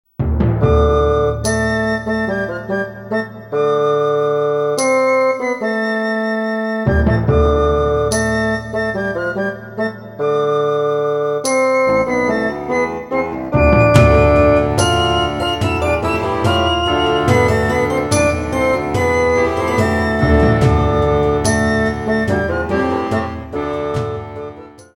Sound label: dungeon hi